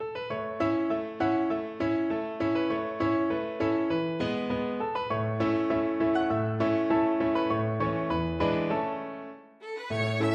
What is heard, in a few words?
oh susanna violin